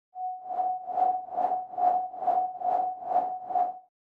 Fly in; Hanekko

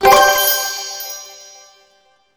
item use

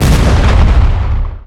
Enemy Hourgrass Active Hit; disney star smash android ios mobile gamerip 2020

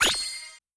Heal Sp Split

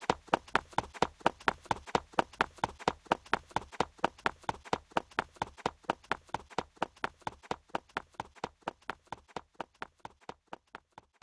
Foot Man Loop

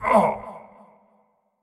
Marv Hurt

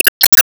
Enemy Active Charge Common Up

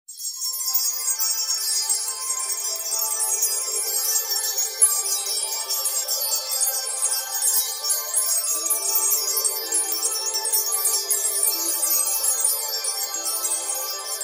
crystal shimmer; endlevel

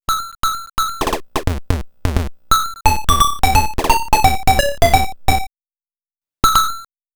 Acquired a Money Chest; Ganbare Goemon Sound Treasure Box

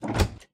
Start Game Lever; Lethal Company

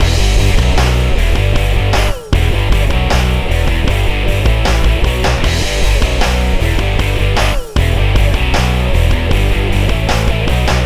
Ditty; NBA Inside Drive 2003; Xbox

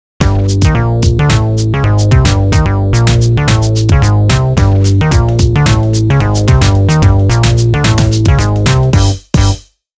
Unknow Jingle; Unknow Jingle Type 2

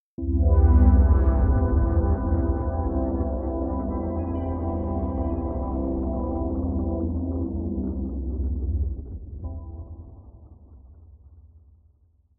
events sounds; 0°N 0°W

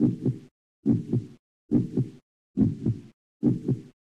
Heartbeat; Knights College

